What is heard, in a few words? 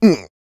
YELL, MALE HUMAN; Spectrasonics Vocal Planet